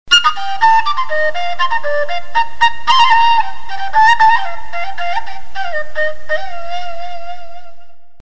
Nokia flute remix

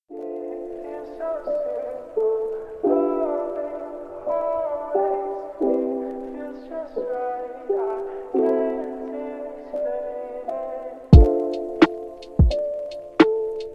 lo fi type beat

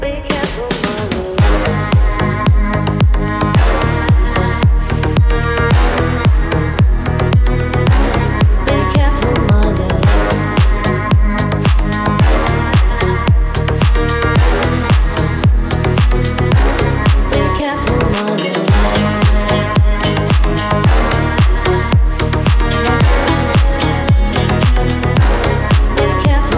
romantic dance remix

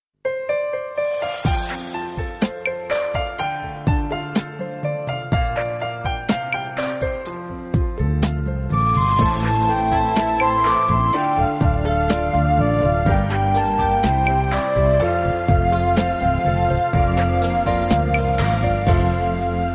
romance ringtone

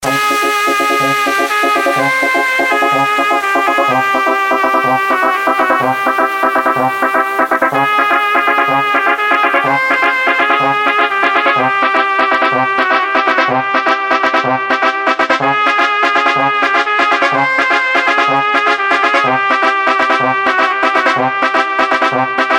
mexican electro

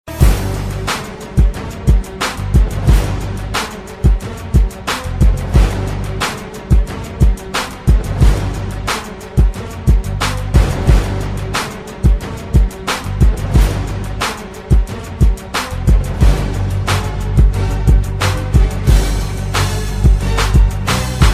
badman beat

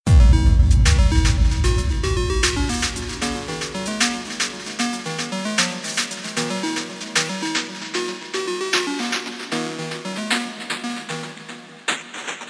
dubstep remix

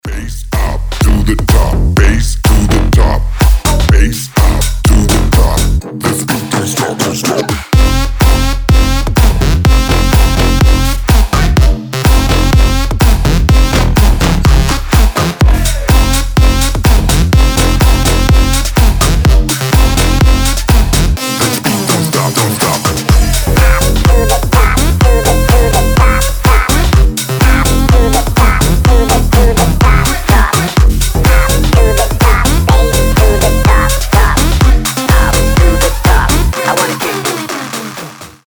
bass up

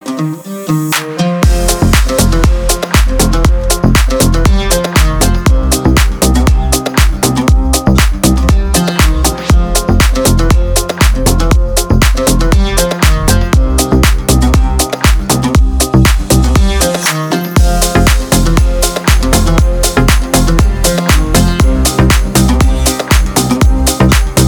remix instrumental

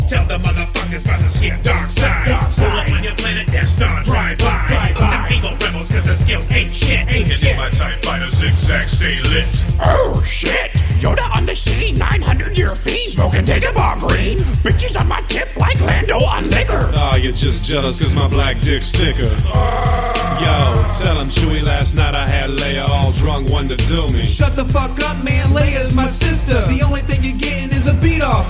gangsta rap

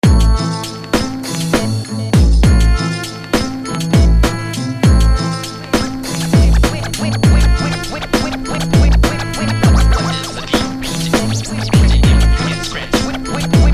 scratch

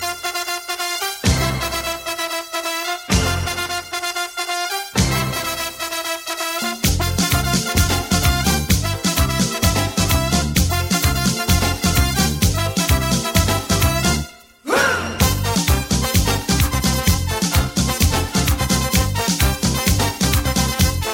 conga line

